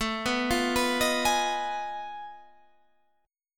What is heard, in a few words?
F 7th Sharp 9th Flat 5th A